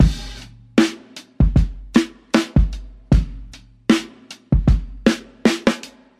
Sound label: Drum loops, Boombap